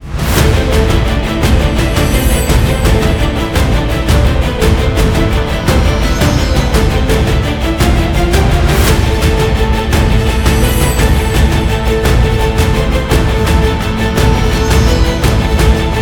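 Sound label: tension, guitar bonus version; by burak sound bank; tension gerilim vol 6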